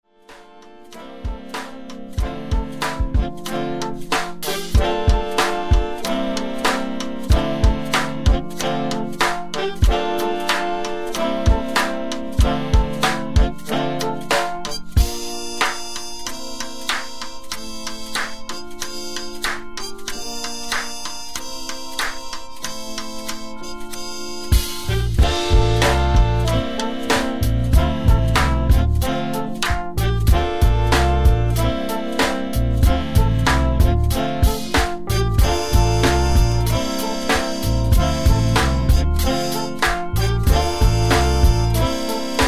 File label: accordion